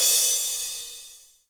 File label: drum hit finish